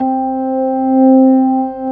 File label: ORG B3, B-3 Organs; Sound & Vision; Gigapack I CD 2